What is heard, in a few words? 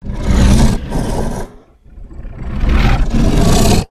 giant death; Original TK Sounds